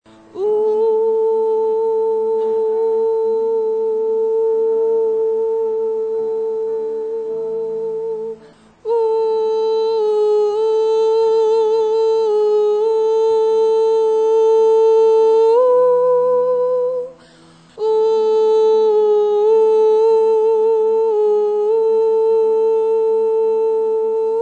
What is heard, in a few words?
tenor